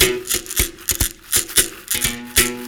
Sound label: World Lick